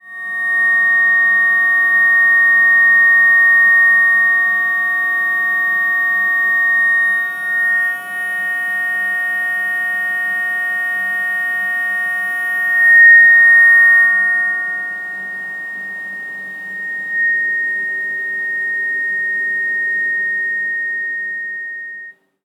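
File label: splash 1857hz; cymbal clusters; sine-wave excited; sabi 10in; hologram; Resonant Systems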